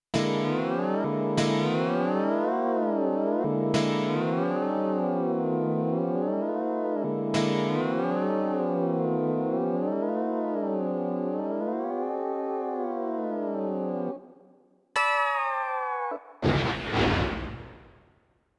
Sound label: CM500 GS